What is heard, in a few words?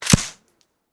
Bullet Pass By; Sound Ideas; Guns